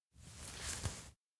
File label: grip hand tight; awayuki; anemoi パッケージ版 1.1